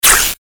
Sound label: static bright; Static Bright Burst; Production Element Imaging Element Accent Transition; Blastwave